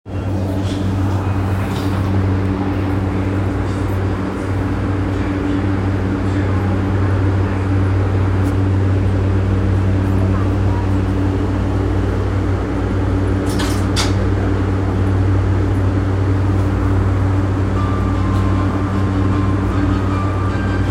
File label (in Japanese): 半蔵門線半蔵門西